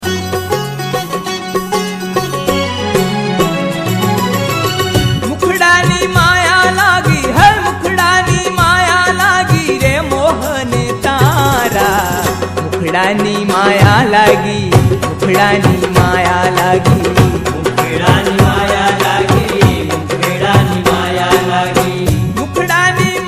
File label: Dhun